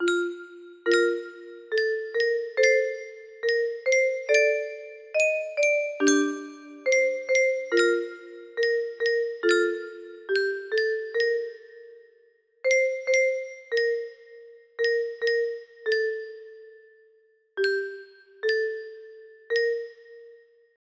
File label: music box